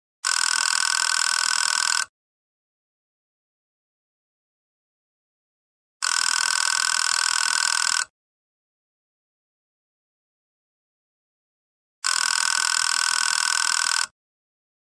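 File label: old telephone ringing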